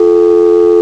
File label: Dial